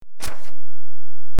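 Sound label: Single Scissor Cut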